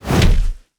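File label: BOSS Attack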